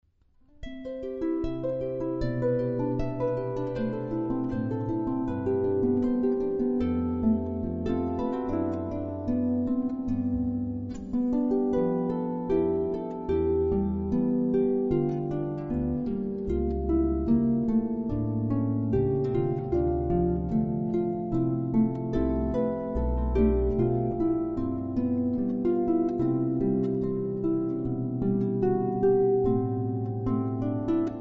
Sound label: Air on a G String, harp